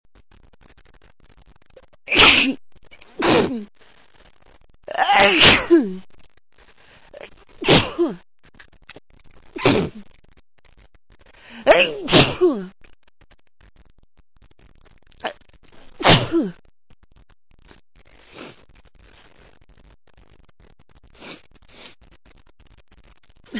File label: morning blow, female